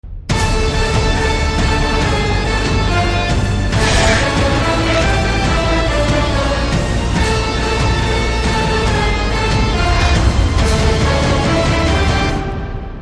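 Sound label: Trailer background music Ringtone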